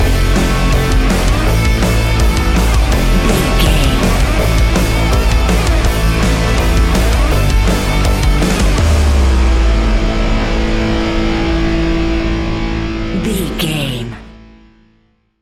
A huge and aggressive piece of classic Heavy Metal music. Very heavy distorted guitars, powerful drums and pound